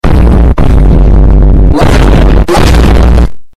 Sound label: bass boosted; the rock; meme